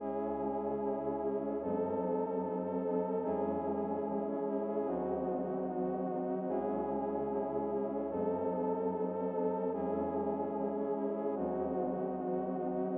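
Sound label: 148bpm Pad Chord C minor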